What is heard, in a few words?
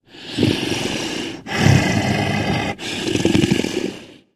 tank breathe; voice; idle; Left 4 Dead 2